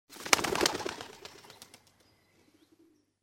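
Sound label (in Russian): another takeoff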